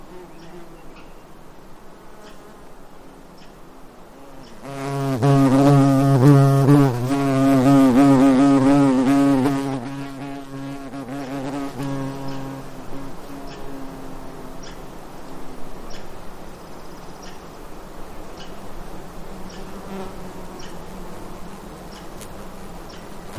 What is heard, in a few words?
Insect Wings Close to Windscreen; INSECTS; Alaska